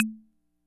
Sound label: Ace Tone Rhythm Fever Perc, Drum Machines; 300 Drum Machines